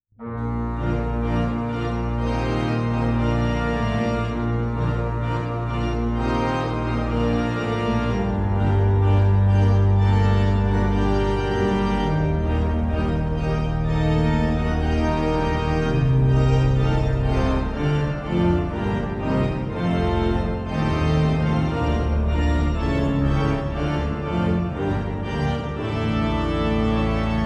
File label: grand choeur